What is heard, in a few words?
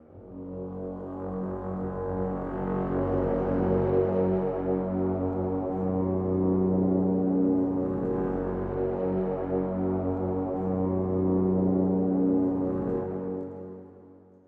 Dramatic Brass